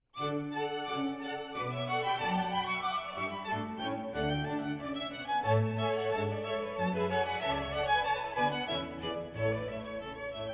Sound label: Classical, music-on-hold